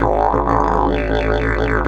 130, Didgeridoo

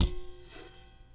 BRUSH BOWL, PERC METALIC; Rarefaction; Poke in The Ear With A Sharp Stick

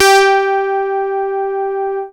BASS G4; Q Up Arts; Dream Experience

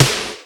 NZ GATE, SONIC DRUMS; Greytsounds VOL-1

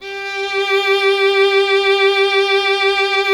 violin, Solo Instruments

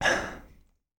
phah; AKAI S-Series CD-ROM Sound Library VOL-6; HUMAN SE

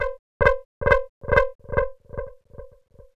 NOISE, FX LOOPS; USB Soundscan vol.51; House Side Of 2 Step; AKAI

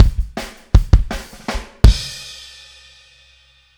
Outro Kick, 162 BPM, Drums

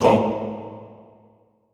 TONG A1, Extended Classical Choir; Best Service; SHORT TONG

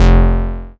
bass F1, Moog Minimoog

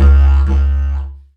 Didgeridoo Kit Samples; Sonic Foundry (Sony Creative Software); The Electronic Music Manuscript Collection; Battery 3 Sample Kits

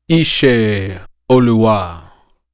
African Lead Vocal; Continental Drift Aftershocks; Vocals

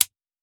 Household Perc

